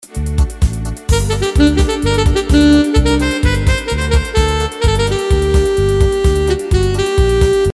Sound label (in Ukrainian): vyvatek saks